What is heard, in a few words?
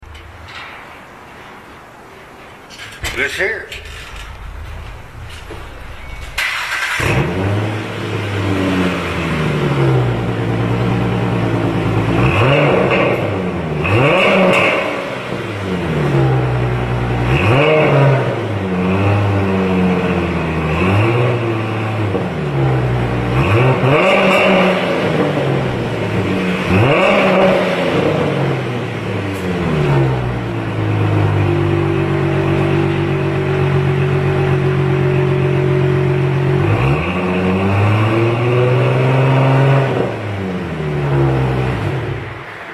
Mustang 4.0L 6cyl., custom exhaust systems